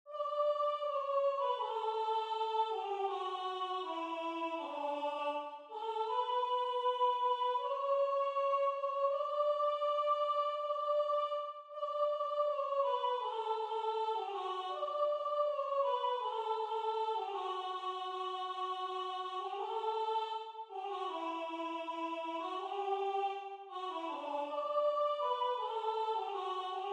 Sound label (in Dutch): Sopraan; Joy to the world